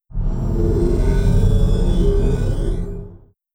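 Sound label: shield hit layer; apocalypse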